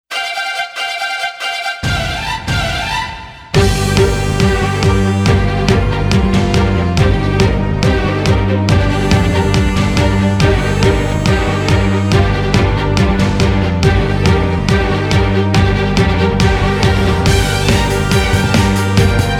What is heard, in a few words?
Sports Dramatic Epic Orchestral Anthem